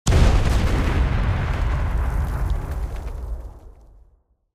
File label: explosion; Secret Agent